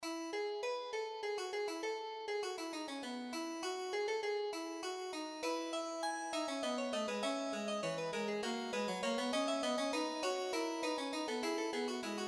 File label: Fugue